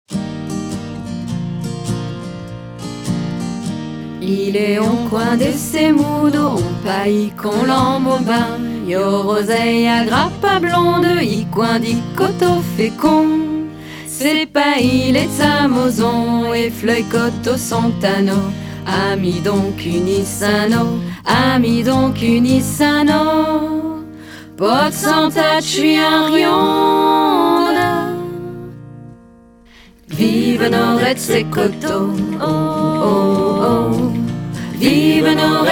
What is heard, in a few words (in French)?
Barbotz Chamosarde Version Patois; chansons chamosardes; chamoson